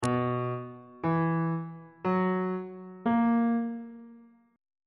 c flat major 7th suspended 4th chord intervals on bass clef